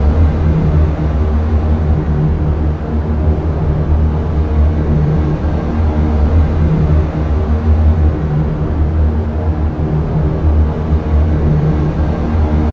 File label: Alien weapon